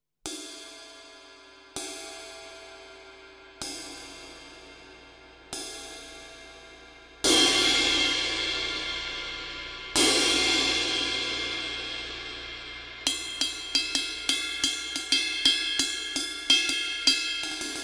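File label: ride BYZANCE MED TRAD 21, CYMBALES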